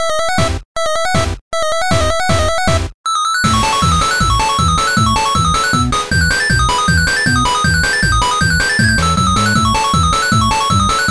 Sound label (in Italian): zufolo impazzito; wine fox; yes steve model